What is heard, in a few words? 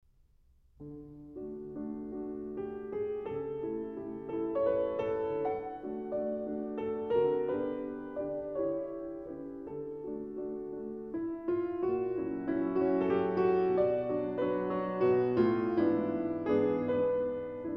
Klavierstücke Andante con moto